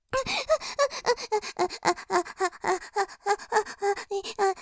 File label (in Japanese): 喘ぎ メス犬のように、後ろから感じちゃう; モノラル; ループで楽しむ！シェフの気まぐれ抜き抜きコース